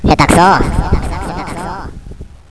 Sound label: SHOOTING; Roly-Polys no Nanakorobi Yaoki